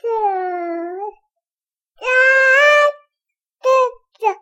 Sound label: Baby Talk